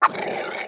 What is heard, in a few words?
throwable attack